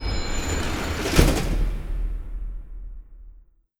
Gate Open

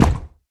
Shield steps

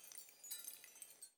Desert Rain; gamerip

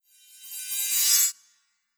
Ice Thorn Charge

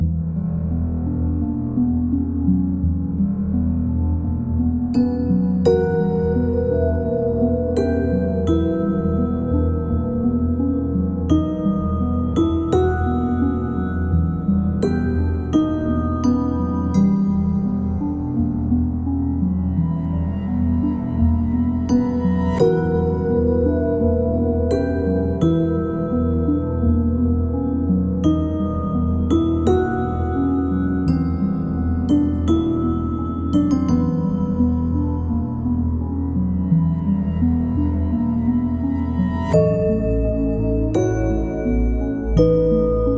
BGM Lobby; starbites

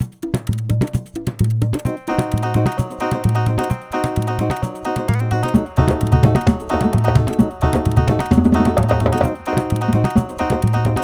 music cave bpm130

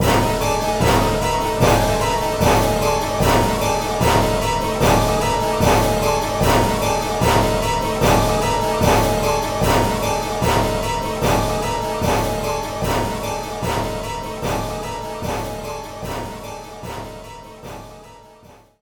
Spooky

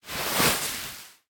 Beanbag Sit; Sittable

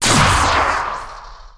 Long Medium Shot; Legend of Keepers (gamerip) (2019)

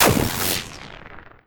Gargoyle Night Burst; Legend of Keepers

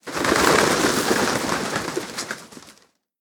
Props Birds Flying Away

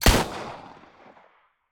Doctors Gun; unsighted

